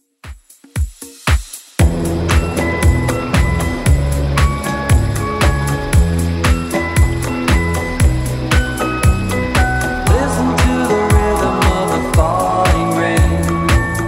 60s Redrum 116bpm

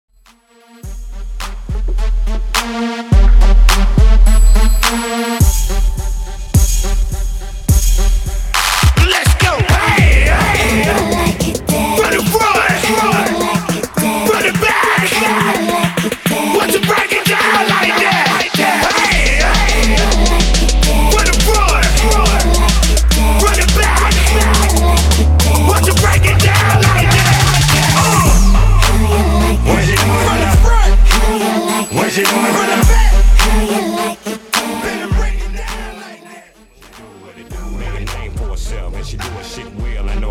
Epic Intro Edits

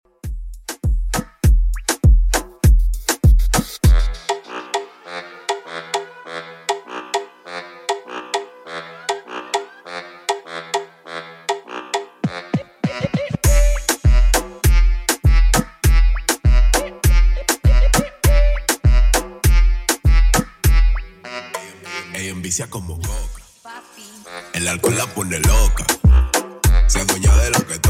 Moomahton Redrum 100bpm

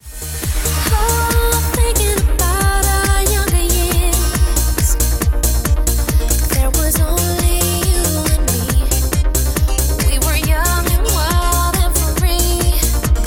REDRUM Slam End 138bpm Eb

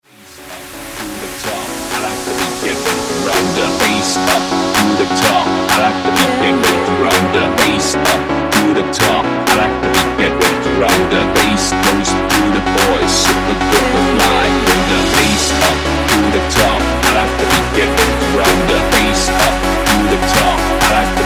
hype clap drop 127 bpm

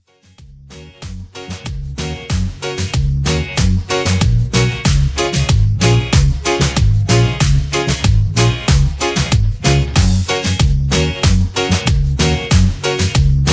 Redrum

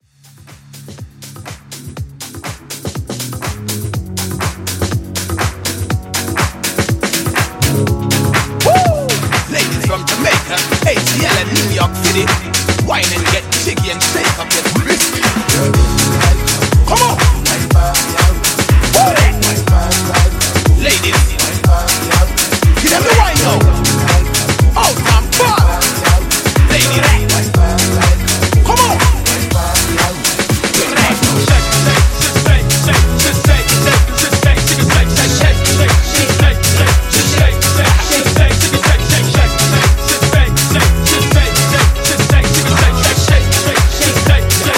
House Blend 122

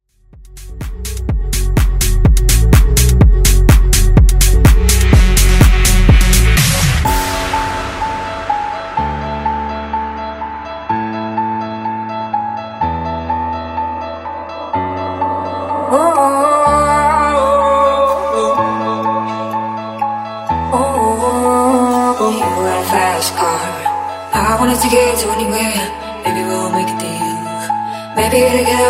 Womp

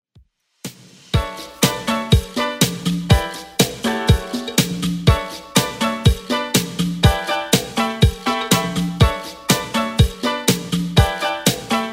80s Redrum 121bpm

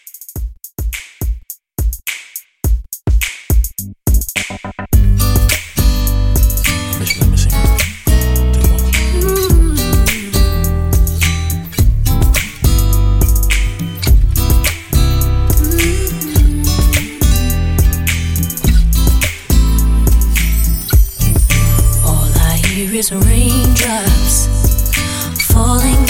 2k RNB Re Drum 105

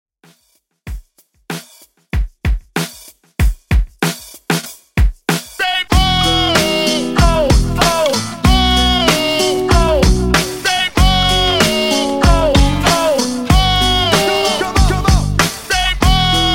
Hype Intro Redrum 95Bpm

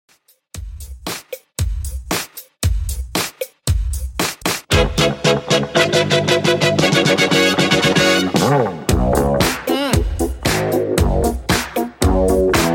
70s Funk Redrum 115 Bpm

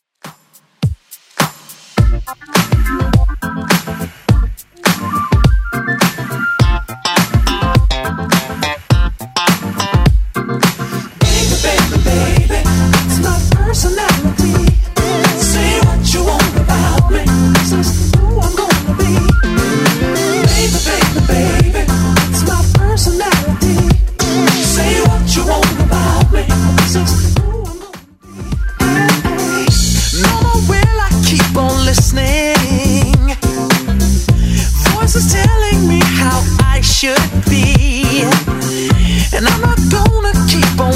90s Redrum 104 bpm

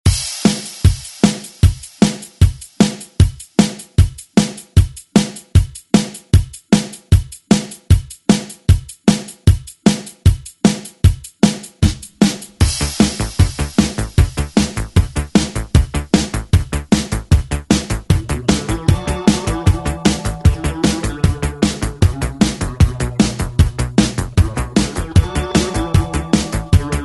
80s ReDrum; DJ Mhark

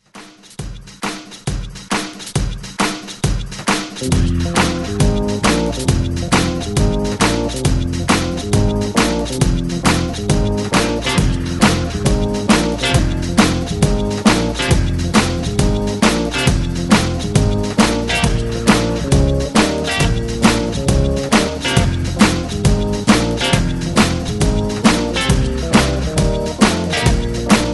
60s ReDrum 134 Bpm; DJDOLLS

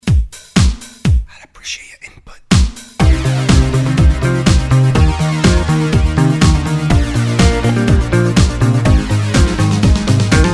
90s Dance Intro 123 Bpm; DJDOLLS

